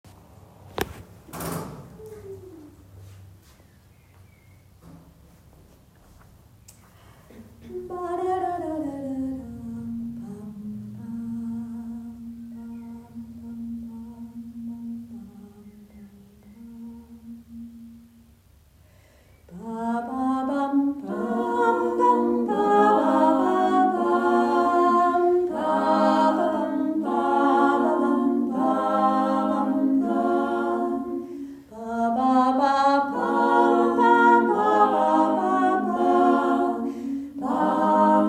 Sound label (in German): Lied Äolisch (natürlich)